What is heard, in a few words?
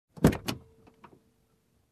Opening Car Door